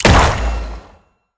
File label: Gun Fire; The Town